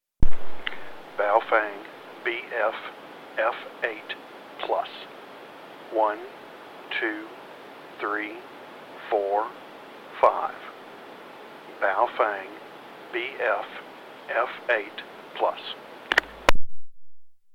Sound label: baofeng bff8plus